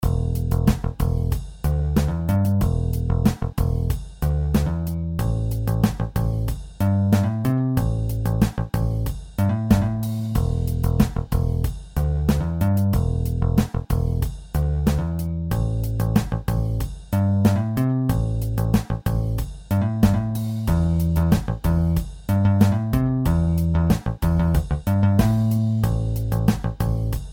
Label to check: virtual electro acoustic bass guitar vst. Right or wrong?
Right